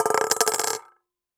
Coin On Collander

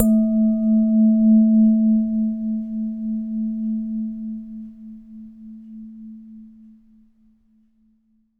Living Room Small, Lampshades

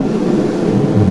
Snare Drum; Percussion Samples

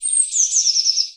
Birdsong Bit, Oxford Island Lough Neagh

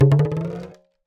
Talking Drum Bounce; Percussion Samples

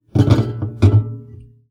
Classical Guitar Extended Technique

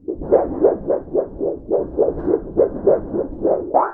Storage Heater

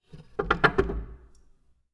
Music Stand, Piano; Piano Miscellaneous

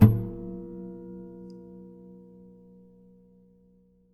Classical Guitar Extended Technique Plink

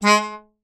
Melodica Short Note

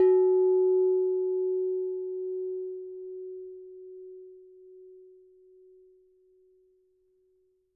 Struck Vase

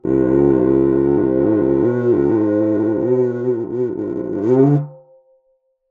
Cuica; Percussion Samples

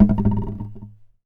Classical Guitar Bounce; Classical Guitar Extended Technique Stereo

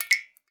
Drips

